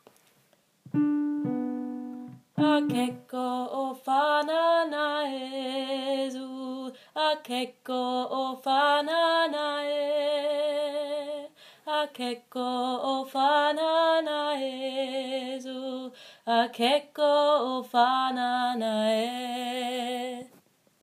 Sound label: Alto 2